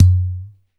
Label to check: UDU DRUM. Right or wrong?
Right